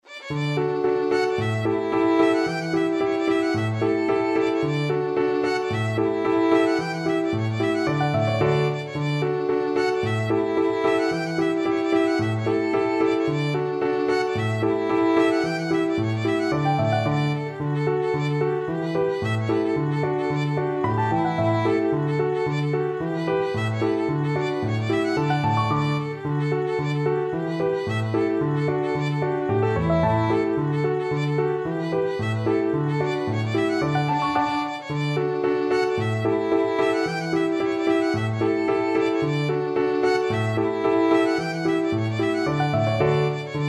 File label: Hornpipe violin; Bang Up